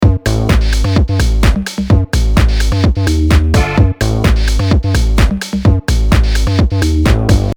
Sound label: riff with beat; 10 track starters